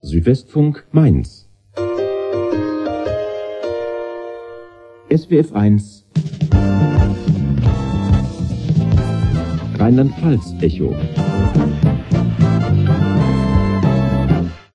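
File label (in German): rheinland pfalz echo, Radiojingles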